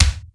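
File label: processed kick, drums; Crypton - DrumBase5000; PROC.DRUMS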